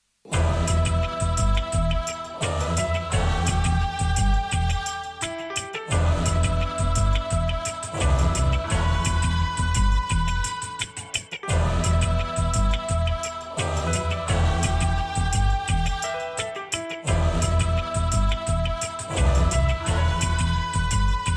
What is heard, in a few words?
Gospel DnB